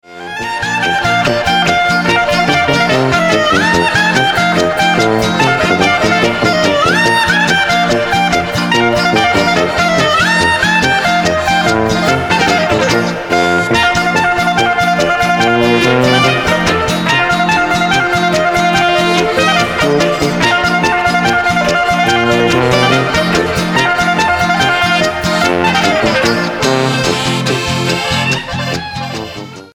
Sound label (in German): Lachende Klarinette